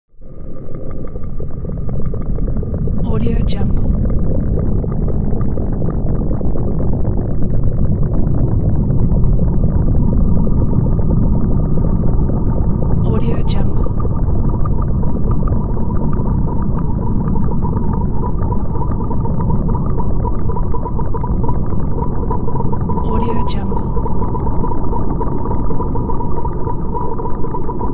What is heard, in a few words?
atmo drone bubbling; smartsoundfx